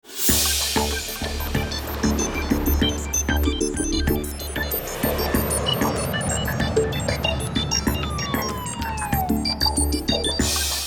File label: Shield Generator; serious sam 2 re engineered soundtrack 2005